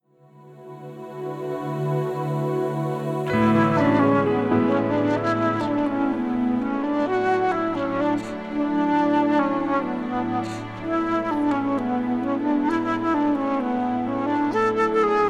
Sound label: Instrumental